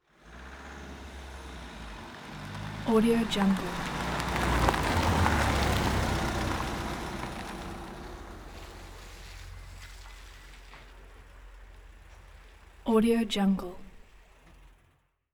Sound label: car approach break